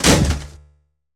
Impact - Tech Debris

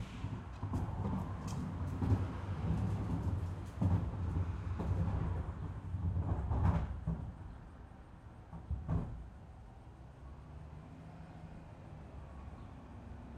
City, Buffalo, Residential, Traffic, Roll; UberDuo; The ‘hood Audio Playset

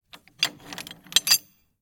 METAL CHAIN Old Barn, Manipulation, Clinking; Ghosts Return; Articulated Sounds

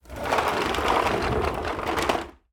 Wooden cart rolling on concrete; Sound Ex Machina; Rolling Objects

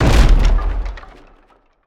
cannonball impact, Naval Warfare; Gladestock Studios

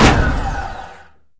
crane vertical stop, doors; hl2

